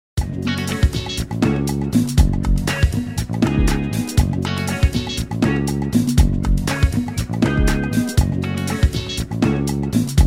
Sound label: smooth jazz jam